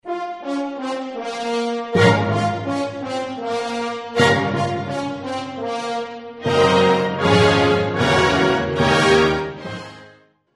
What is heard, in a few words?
tchaikovsky piano concerto 1; 1875